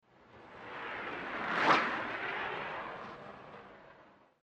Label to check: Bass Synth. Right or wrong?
Wrong